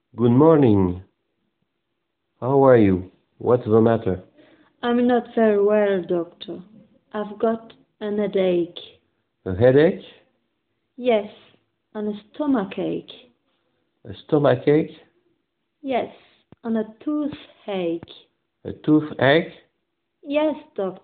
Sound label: vocal